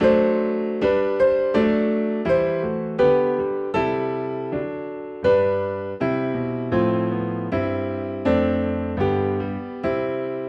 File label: Piano in C; Oh Sacred Head Now Wounded